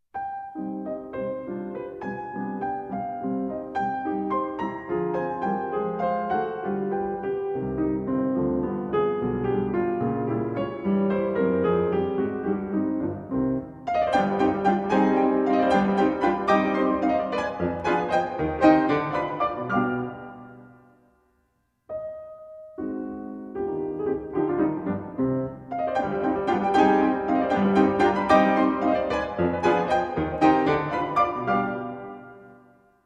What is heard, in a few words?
Piano Sonata in C Minor, 2 Pianos; No. 14; Arr. For 2 Pianos By Edvard Grieg; III. Allegro